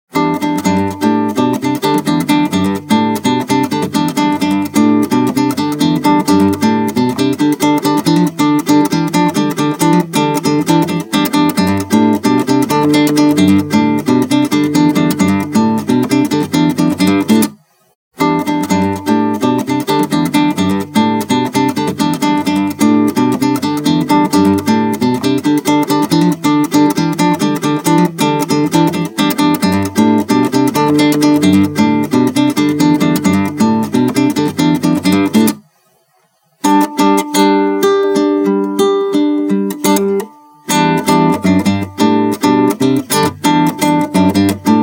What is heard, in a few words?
riff